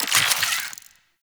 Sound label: Infuse Viral UI; Warframe; Warframes; Alchemist